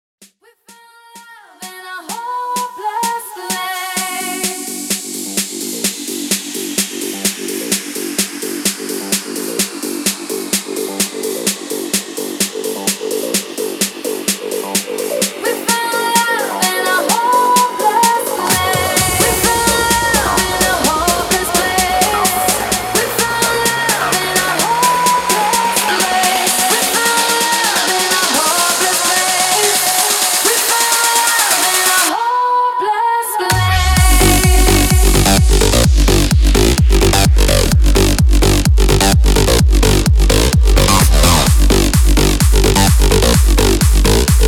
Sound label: 128 BPM; CLUB SOUND MASHUP